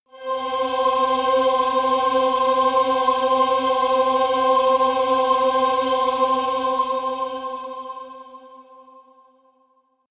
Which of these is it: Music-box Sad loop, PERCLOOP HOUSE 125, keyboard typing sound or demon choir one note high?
demon choir one note high